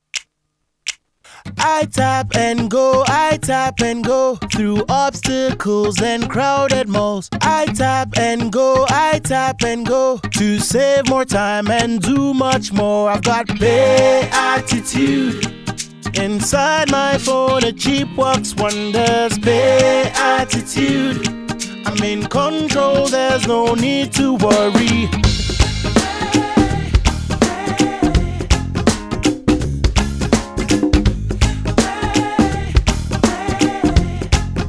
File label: Theme Jingle; PayAttitude